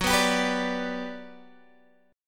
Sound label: G Major F sharp